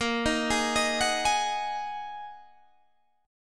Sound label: A sharp 7th Suspended 4th Sharp 5th down Guitar Open D